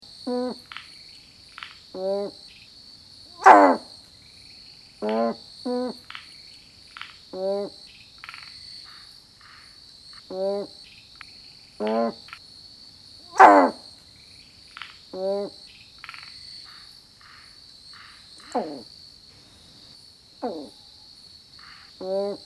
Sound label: Babina subaspera calling